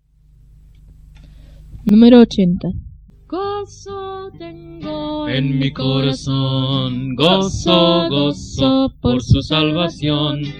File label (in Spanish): Coro, himnario niños